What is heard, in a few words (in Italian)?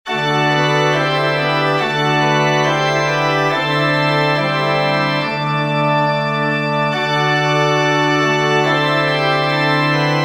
organo